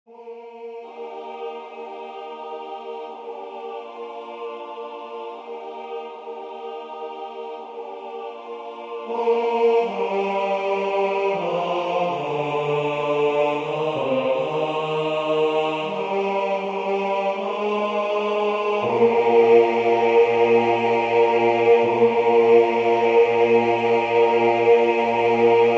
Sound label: bass; Elgar Angelus